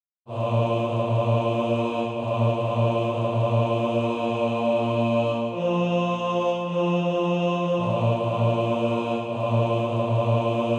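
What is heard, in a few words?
tenor, Practice Music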